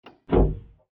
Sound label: release noise